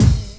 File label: BD hip, Dance Mega Drums; Best Service